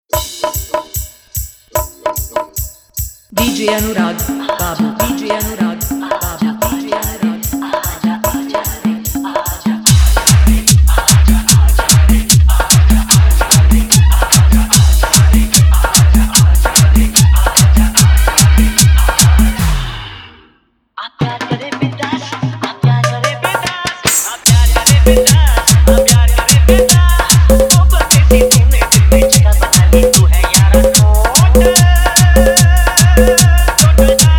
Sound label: Jhan Jhan Bass Mix